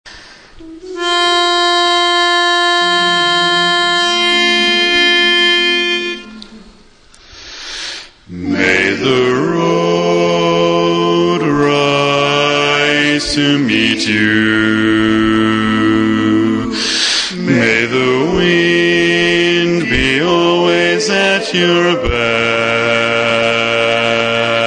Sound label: Irish Blessing Bass